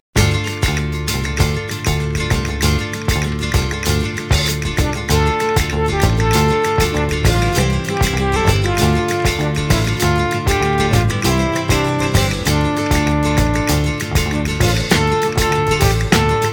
The Bullfrog Instrumental

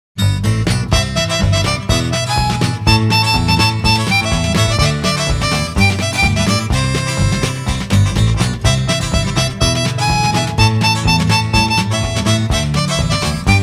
Rushing Instrumental

